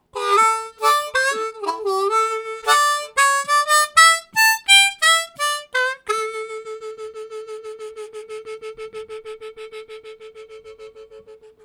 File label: Soft Breath, Harmonica Riffs; Zero G - Harmonica